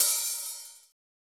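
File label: hi-hat open; Giga Samples Collection; RY30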